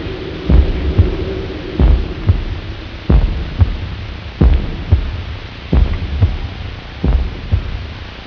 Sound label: heart throb